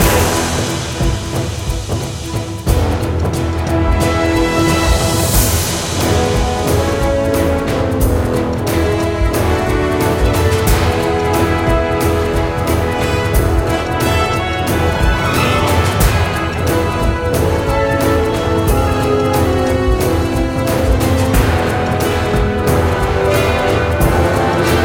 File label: battle; sengoku collection by konami android game music